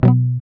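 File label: GUI B, acoustic guitar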